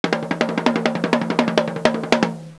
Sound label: run drums; Roulement de tambour